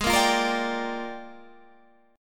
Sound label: Bb 13th Ab